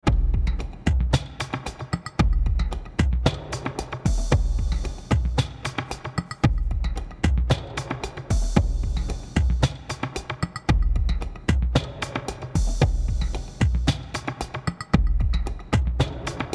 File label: groove active